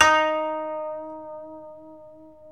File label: KOTO MILD